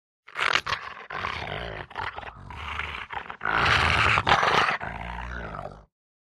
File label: Dog Rottweiler Growl